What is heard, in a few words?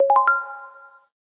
circle unlock, LG G3